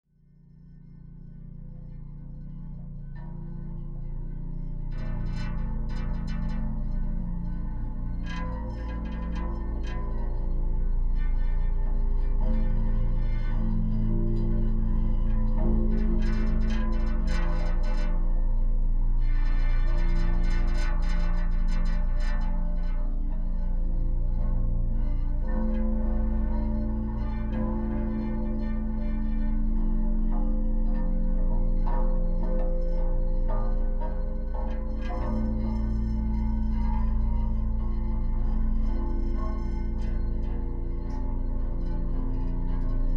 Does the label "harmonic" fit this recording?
Yes